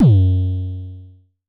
Dist Kicks F#2, Vermona Kick Lancet, Distortion Kicks; LookIMadeAThing Kicks